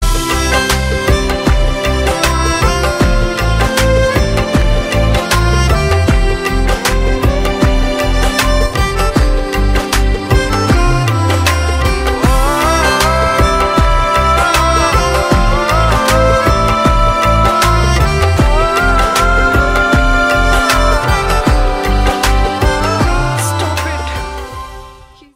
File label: Instrumental